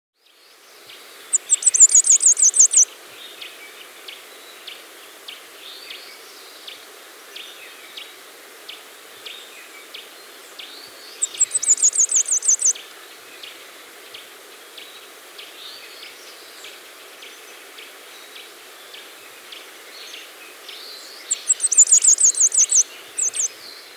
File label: Blue-Capped Tanager (Thraupis Cyanocephala), Andean Birds; S. America